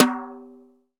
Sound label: TOM SLAP, Real Toms, Dry Toms; Roland L-CD701